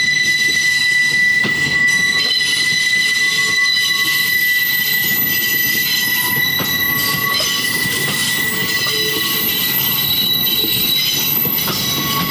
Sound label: Train Wheels Ringing Around Bend